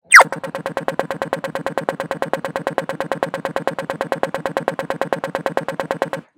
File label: completion signal; Agent Signal Bar; Agent Signal Light